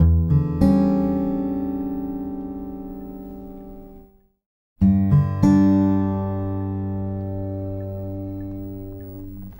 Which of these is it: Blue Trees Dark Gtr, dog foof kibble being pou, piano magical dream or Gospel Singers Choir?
Blue Trees Dark Gtr